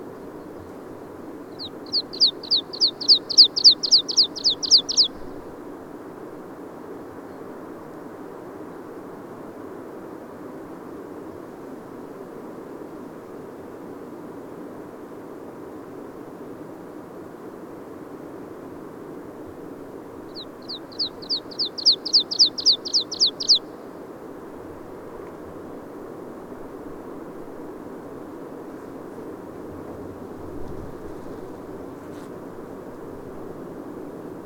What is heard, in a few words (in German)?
Bergpieper; im Gebirge; alpine Rasen, Weiden und Zwergstrauchgesellschaften